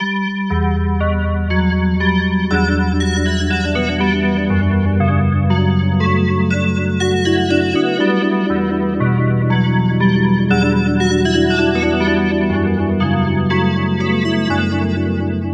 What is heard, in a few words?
DX7 bells, destination rotation